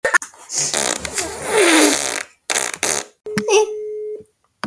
fart melodi

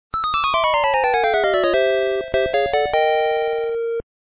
rbi baseball homerun nes

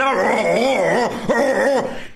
grrgrr

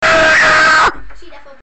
irrational scream

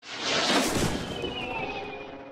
shell bounce

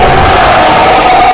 screamer

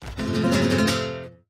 flamenco riff

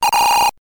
brake; sonic the hedgehog

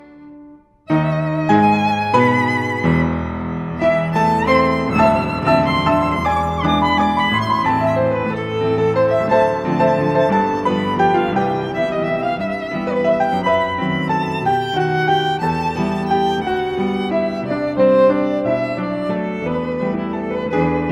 outro music

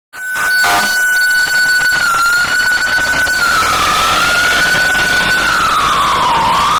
loudest noise ever

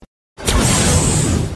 stand appear

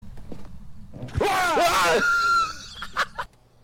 unexpected scream

